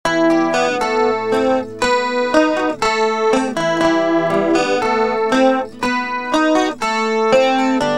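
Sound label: acoustic guitar; dod r870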